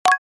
Bright positive click

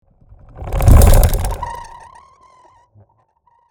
Industrial equipment metallic squeak

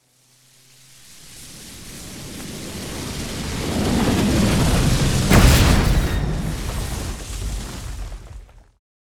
Magic explosion huge magic spell hit fantasy

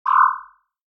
HUD futuristic button confirm

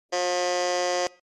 Modern electric doorbell ringing

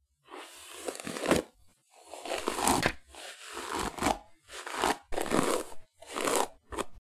Grooming quiet horse

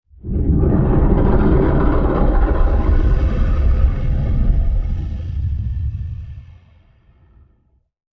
T-Rex dinosaur growl warning

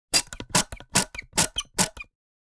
Silicone sealant gun repeated trigger presses; hand tool

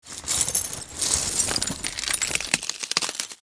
Broken glass and debris rummaging

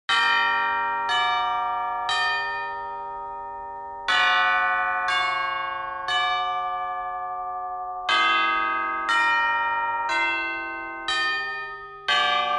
Church bells ringing chimes funeral; large bell

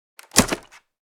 Hamper set down soft impact; basket

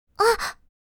Surprise sigh anime girl vocalization

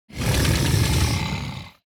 Lizard creature growling snarling

